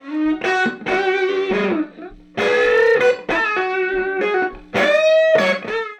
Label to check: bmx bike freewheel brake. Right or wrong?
Wrong